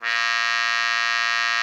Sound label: CUP MUTE A#2; Xsample VOL-3